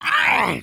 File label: flail; creatures organic